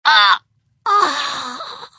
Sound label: female pain death; firefight